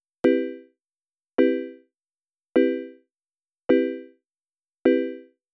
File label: adsr marimba; Wiwi Digital music technology